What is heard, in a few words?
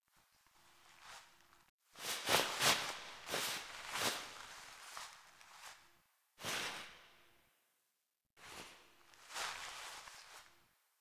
Throne Room Intro Foley; Sentient Throne Room Operator Intro